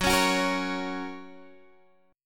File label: F sharp Major, Guitar Standard; strummed; x,9,11,11,11,9; JGuitar